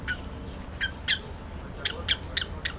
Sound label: Eudocimus ruber